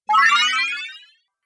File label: Magical Transformation; Magic Sound Effects